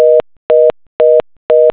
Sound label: Network Busy; Phreaking; Wardialers